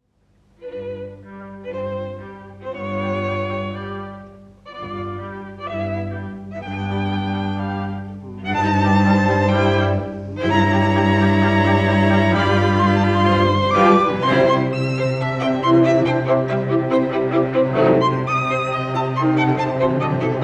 Quintet in C Major Op. 163 I Allegro ma non troppo; Schubert